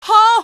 impact; episodic; episode 1; Source engine

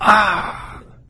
death, player

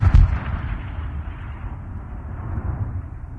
city battle; streetwar; Left 4 Dead; Source Engine; video game

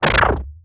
charge; rescue ranger; video games; source engine; tf; weapons

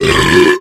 taunt burp; video games; source engine; tf

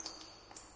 ambient water drips; source engine; portal2

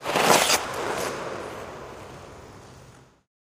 taunt skating scorcher stride; video games; source engine; tf

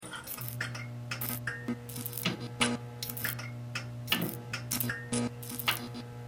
light flicker loop; Source Engine; de deadblock

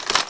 weapon impact soft, metal

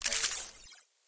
objective panel collapse; ui

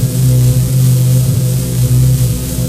combine shield touch loop, machines; ambient; Source Engine; video game

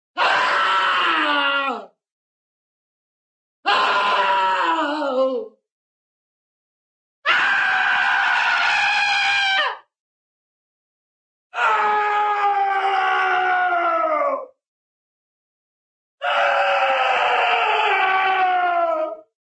scream male horror; cartoon

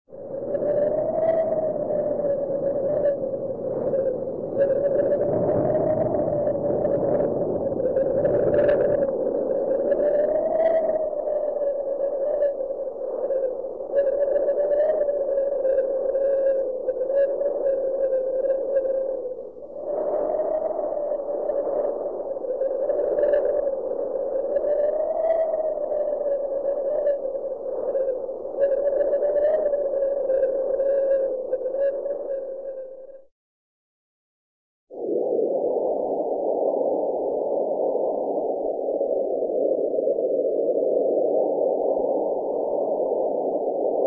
wind soft wind, weather; cartoon

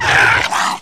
zombie dog death; voice; die; source engine; left 4 dead 2

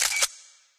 camera; Source Engine; tf

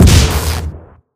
ion cannon shot, energy; source engine; ep2

